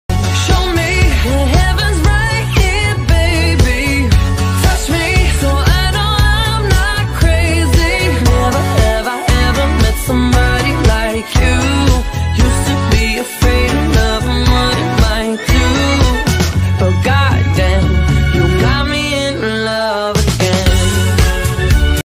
english ringtone

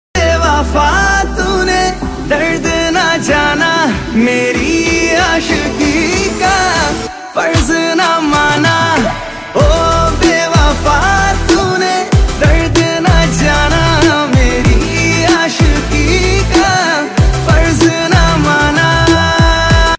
ringtone

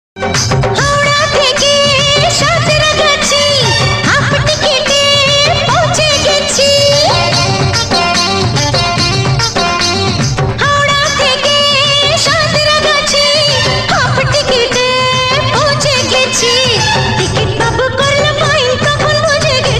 bengali ringtone

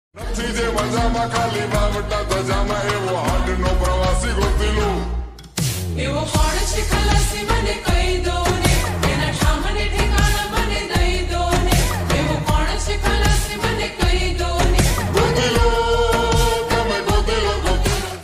song Slowed Reverbed

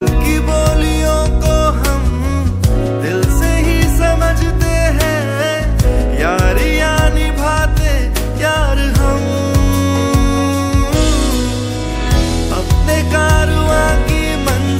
ringtone

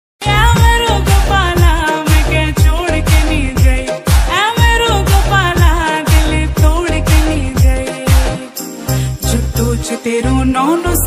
uttrakhandi ringtone

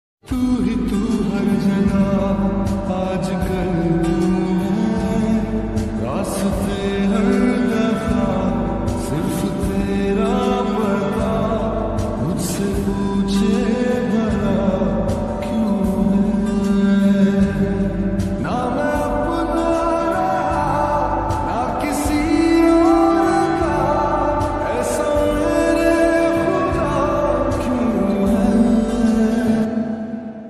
lofi hindi ringtone

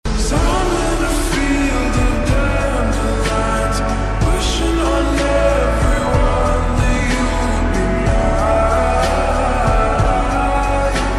(slowed) english ringtone

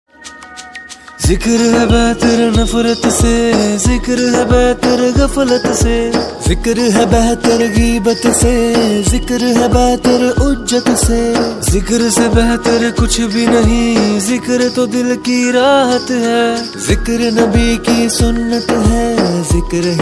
Zikr islamic ringtone

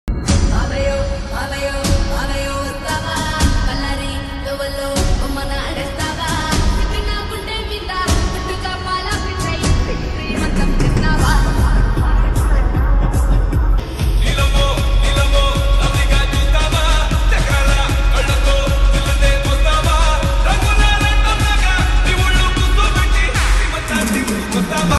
lofi telugu ringtone

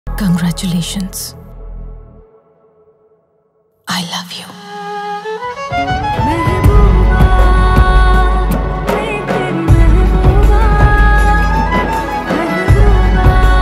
telugu ringtone

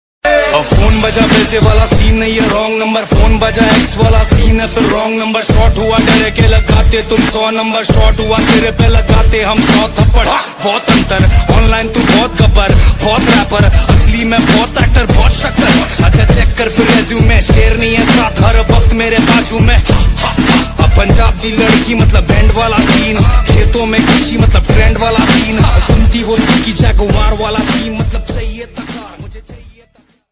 hindi rap ringtone

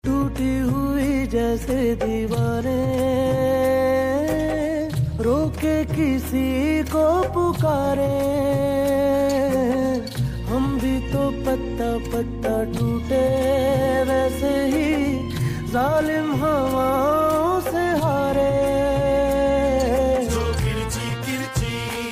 Pakistani ringtone

